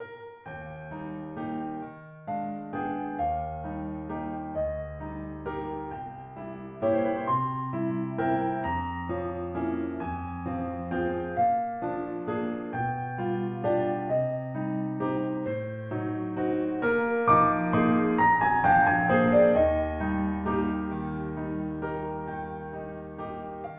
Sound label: piano